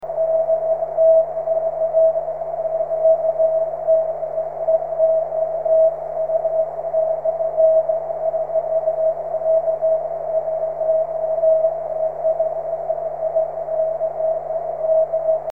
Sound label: 13cm cq cw; ok1dfc